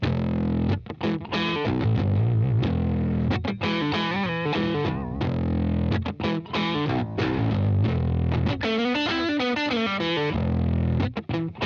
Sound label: Crunch